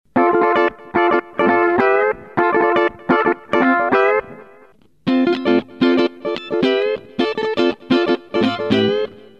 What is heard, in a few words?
Humbucker Single